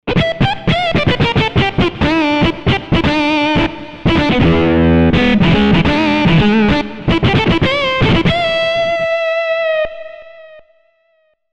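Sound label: Guitar Lead Guitar Switch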